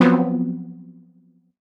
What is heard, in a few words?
stab fear, stabs